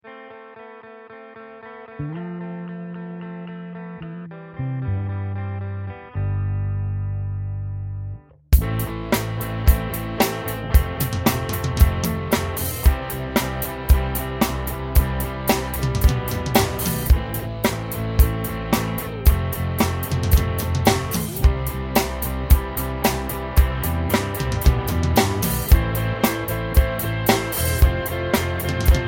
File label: drum